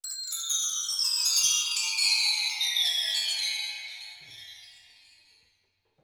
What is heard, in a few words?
Xylo